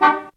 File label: CAR-FX